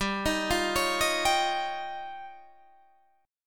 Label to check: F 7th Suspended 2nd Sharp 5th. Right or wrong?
Right